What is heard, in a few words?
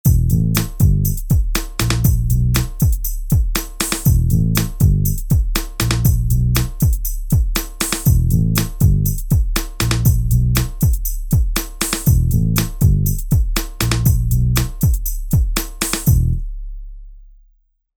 Rap Hip Hop